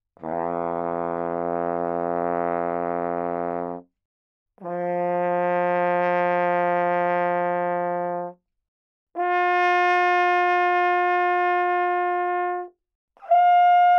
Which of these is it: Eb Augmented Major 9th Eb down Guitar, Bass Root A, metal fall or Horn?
Horn